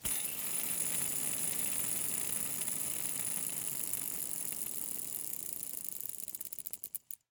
Rollerskates, old fashioned, all metal, wooden wheels, swipe, spinning, slow to stop; 2 mics; focus wide; Eiravaein Works; Vaeyan IV